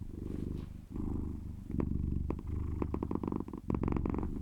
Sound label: cat purr; A Cat Game